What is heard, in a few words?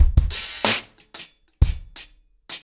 90BPM, ACOUSTIC DRUMS